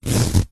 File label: clothing shorts drawstring pull tighten; foley; zapsplat